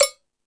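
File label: Jungle Percussion, Drum Machines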